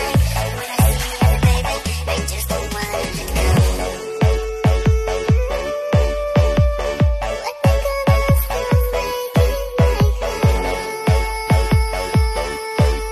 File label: full beat